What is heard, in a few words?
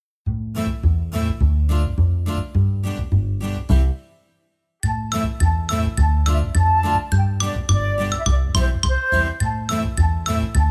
instrumental; fussball eislauf rudern